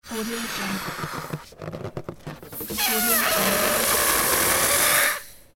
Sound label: balloon